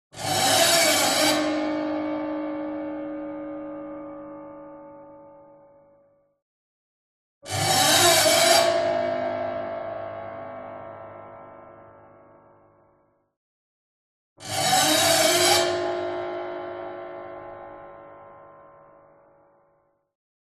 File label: CINEMATIC HORROR Piano Bow String Broken; smartsound